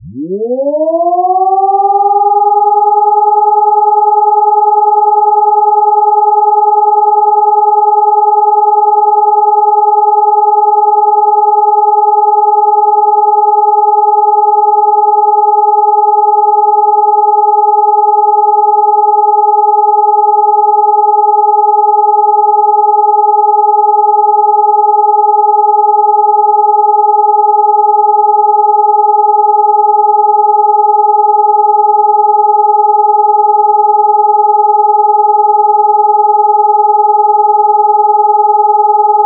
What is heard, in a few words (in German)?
Entwarnung